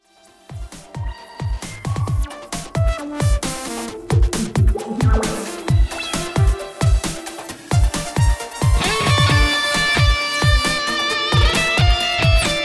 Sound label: guitar